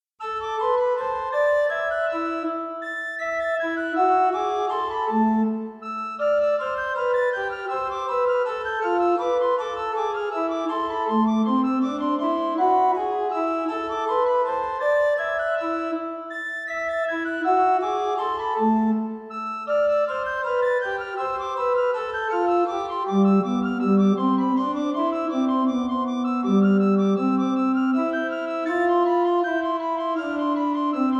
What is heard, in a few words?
prestant flute 4; Pibrac